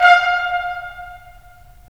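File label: brass trumpets staccato, left; Roland LA Composer vol. 4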